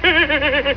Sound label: LAUGH; (Goofy laugh); Caddyshack II